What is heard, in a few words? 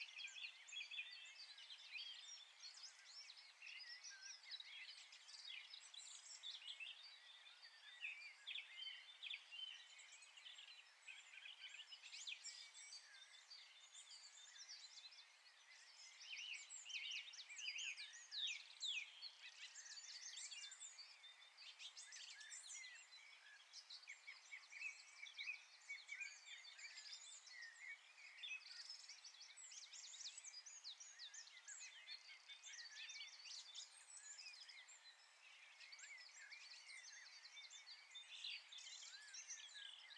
Bird Amb, Garden